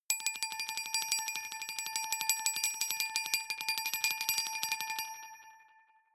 glockenspiel sparkle tremolo low

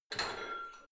barbell heavy plates lift rack, fitnessstudio

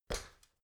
step ladder metal single; schritte

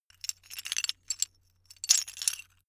metal pieces clatter

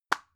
drug package drop soft; arzt

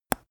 teeth clench; koerpergeraeusche; menschen; soundpool

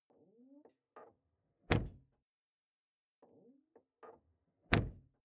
car door close exterior hummer h3; Cars

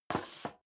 step sneaker metal scuff; schritte

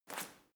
medicine ball throw; sport freizeit; fitnessstudio

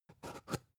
spice jar big scratch open slow; industrie wirtschaft; gastronomie

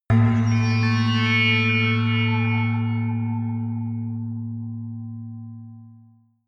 whistle synth high, drones

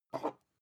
glass pick up smooth soft; objekte

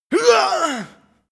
male death short; schreie; menschen; soundpool

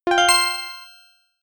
feedback positive, signale

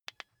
car key button click signal fast

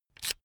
electric drill template adjust heavy out; soundpool

